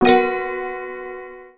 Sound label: Anticipation, ringtones; HP TouchPad